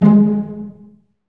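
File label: string section, orchestra; Roland - LA Composer vol. 1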